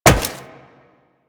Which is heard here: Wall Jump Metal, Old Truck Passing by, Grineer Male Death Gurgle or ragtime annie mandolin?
Wall Jump Metal